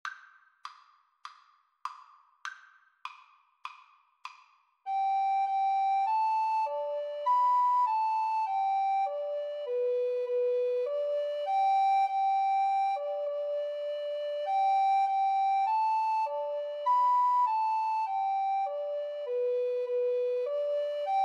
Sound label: ode to joy, Recorder Duet